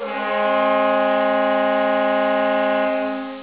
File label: Airhorn